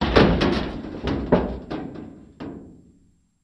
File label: Garbage Can Dbl Impa, Garbage Can Impact With Light Plastic